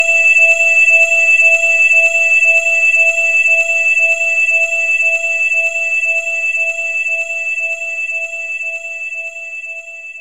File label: Synth Bell; knights try